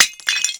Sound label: Glass Smash Plate Ceramic Large On Concrete